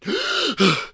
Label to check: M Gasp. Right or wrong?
Right